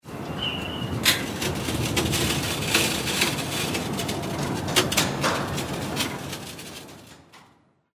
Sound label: roller